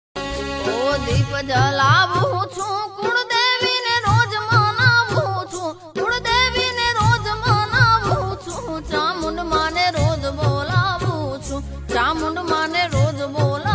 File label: ringtone